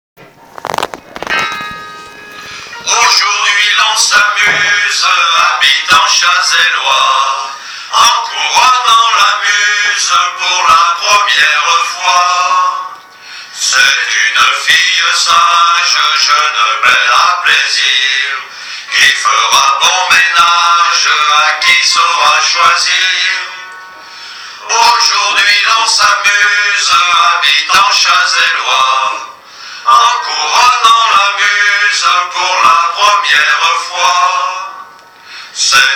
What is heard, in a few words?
Vocal